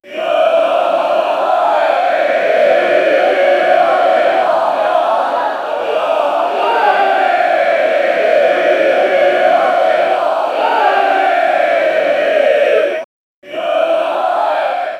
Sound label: create an audio recording, enthusiastic